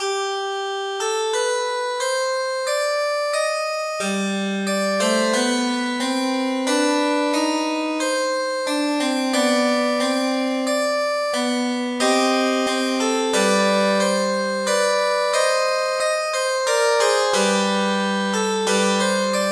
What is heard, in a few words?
Fantasia del quarto tono, MIDI